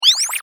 Maximise, Effect To Accompany Maximizing Of Window Or Program; Transitions; Multimedia; Stuart Duffield